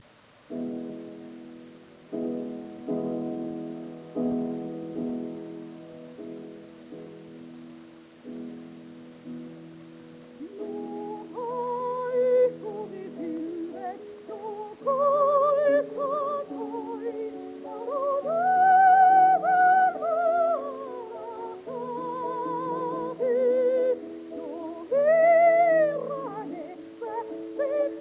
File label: Gramophone